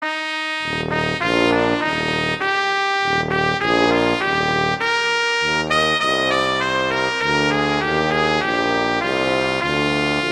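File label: Duet, horn; Mozart; Duets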